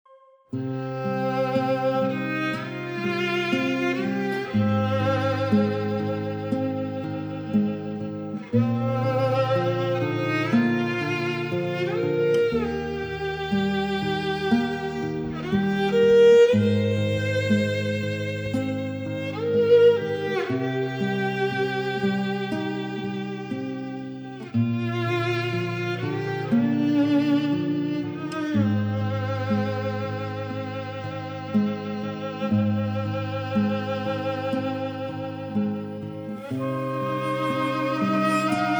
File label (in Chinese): 伤感的背景音乐